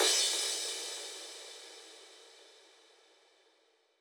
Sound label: 135BPM Crash, F# minor; Drums & Perc